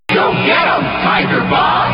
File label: jock jingle go get em shout; tiger bob